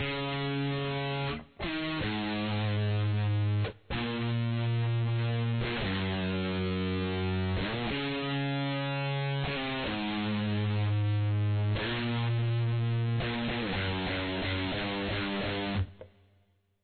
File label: guitar verse